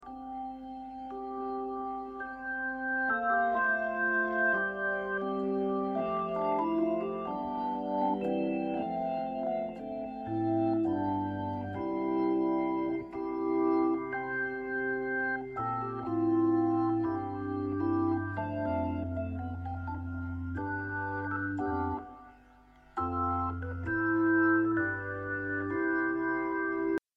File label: GlassHouse, HammondT522; The big clean Hammond.; Compare with the "Kindness" sound above to compare internal Leslie to the 760.